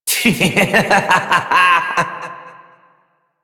Laughter

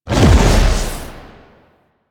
Howl Jump; Warframe; Voruna

